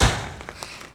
Hockey Skate And Board Hit; Sports & Recreation

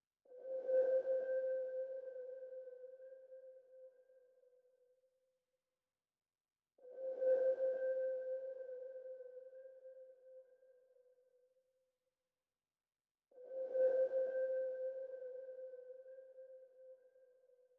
147 BPM F Min Swell; Melody; RnB; Loop Stems; Cymatics; 2022 Melody Collection; Limelight

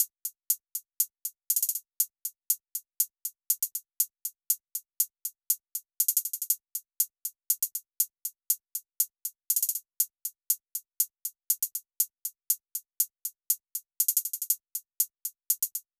Hihat Loop 120 BPM, RnB; Broken

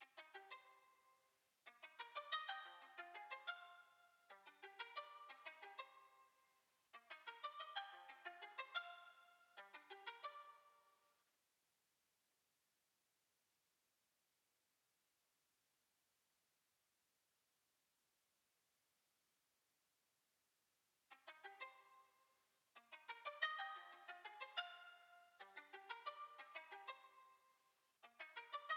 182 BPM G Min Pizzi; Loop Stems; Cymatics; Dom Perignon

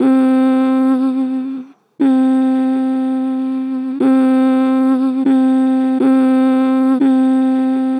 Gen Vib Mmm, Vocals, Natural Vibrato Gentle; Harmor Vocals

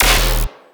Bass Hit D#, Dubstep

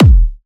Techno, Kick; Techno Sounds 2024

